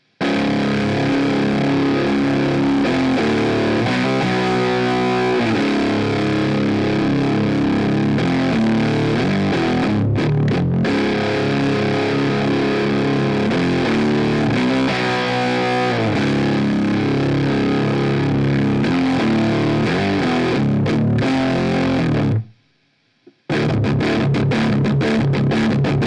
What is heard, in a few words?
laney irt 4x12 celestion v30 ambience; logidy; aftermath